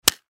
Fly Swatter Large, Fly Swatter Large Whoosh To Impact Soft; Household; Objects; Blastwave